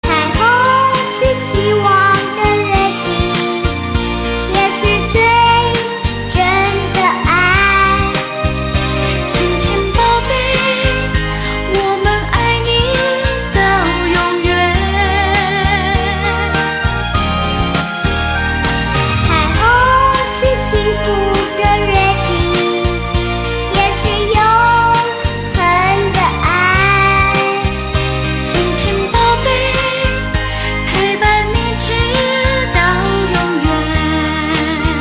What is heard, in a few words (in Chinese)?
就餐铃声; 初中; 广播室铃声系统